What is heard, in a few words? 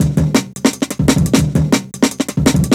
DNB BREAK